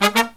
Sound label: LOW HIT, Brass